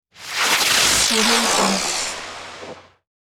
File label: firework spinners; hollywoodedge